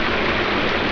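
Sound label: Ambient Water Flowing; Defiance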